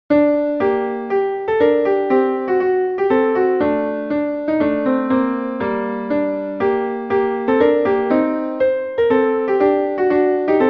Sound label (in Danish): Polska